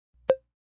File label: Blip; Beeps; multimedia